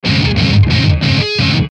Musical Fuzz, Guitar Rhythm Harmonic; Guitars; Blastwave

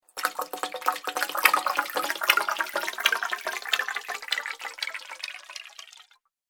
Water Bubbling Echoes, cartoon; Cartoon Sound Design Sound Effects; Andrew Fleming

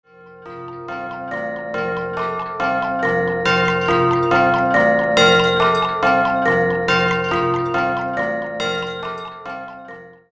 Just Like Clockwork Loop, music loop; Miscellaneous Loops

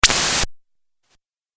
Laser Hiss Video Game

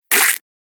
A Rough Squelchy Sound; Cartoon Sound Design Sound Effects

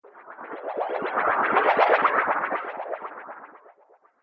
Game Ghost Wobbles, Video Game Sound Effects

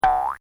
Comedy Effect Spring; Funny Sounds; Bobby Cole

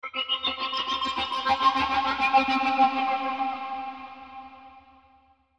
Chopper Reverb Flange Swell; Blastwave; Choppers

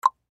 Human Mouth Cluck; Sound Effects:Human

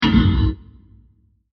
Short Metal Scratch Impact; Bursts; Impacts; Sound Effects:Impacts; CraftedSignals

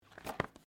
Book Close; Book movement; Offices

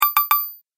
Menu Notify Triple Ding; Ping Sound Effects

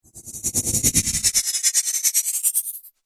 Stutter Whoosh

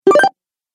Retro Videogame Pickup Bonus

